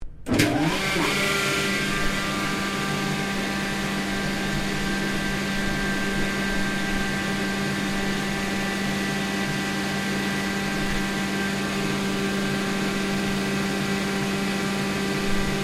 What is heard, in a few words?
Compressor Pnumatic Pusca Operating; Various machines; Machinery